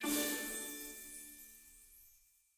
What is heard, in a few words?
little Win; fairyhansel